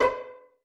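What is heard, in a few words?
pizzicato F#2 MF, STRING PIZZ; Sound On Sound - Peter Siedleczek's Orchestra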